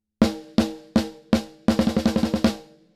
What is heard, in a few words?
Compressor snare